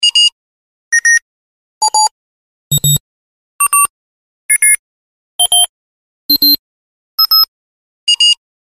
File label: Beeps Digital Multi